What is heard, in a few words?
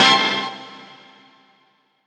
STB END FX; Rawcutz - Nu Forms; Gbm